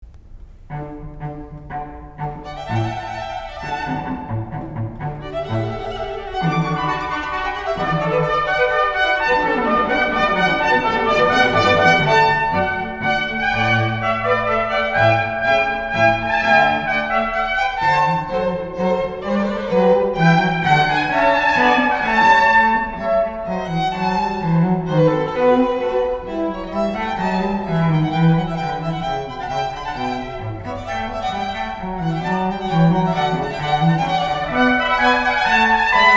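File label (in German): Blechbläserquartett; Jauchzet Frohlocket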